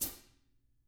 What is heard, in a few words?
foot, Drums; TAMAKIT